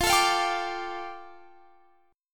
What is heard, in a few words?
F 6th Add 9th F down Guitar Standard, chord; F6add9 strummed